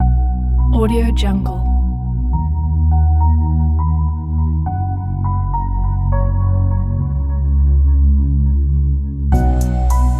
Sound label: hip hop violin